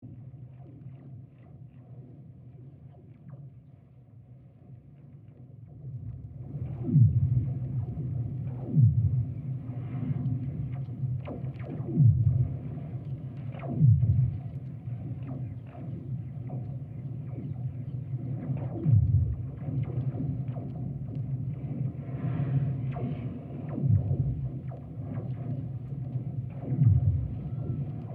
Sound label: pond contact mic ice recordings; Queens Park